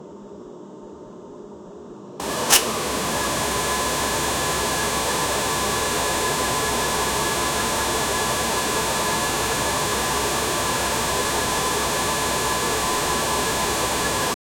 air conditioner blown up

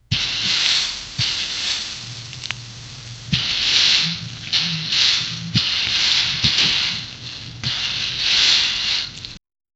running through grass

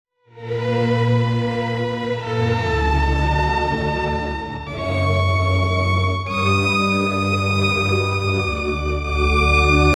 horror thrillers sharp s, string

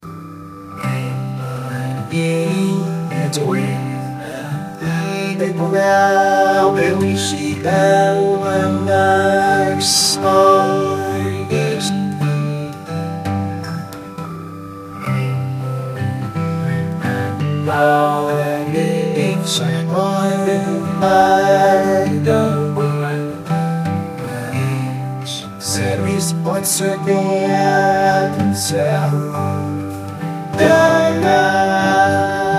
a country song about, country

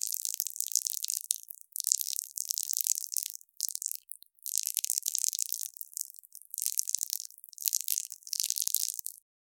spider wet clicking legs, insect

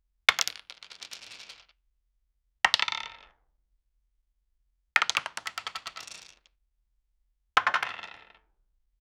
cash bundles are fall; 100 cash bundles are fall; money

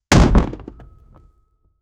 video game cannonball imp

